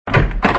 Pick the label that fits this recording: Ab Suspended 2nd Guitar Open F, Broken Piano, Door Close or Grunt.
Door Close